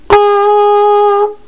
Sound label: FLUGEL